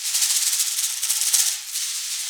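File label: rain sticks; Spectrasonics; Heart of Africa 1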